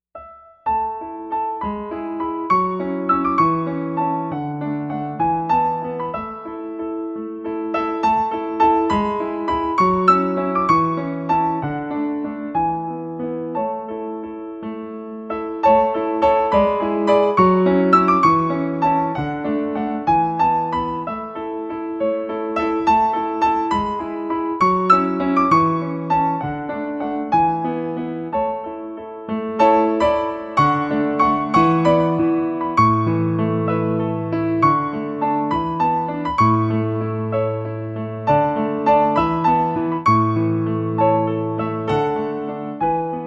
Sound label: music